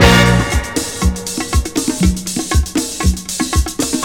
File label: 120 bpm drum loop A sharp key, kick tuned to the A# note; drum loops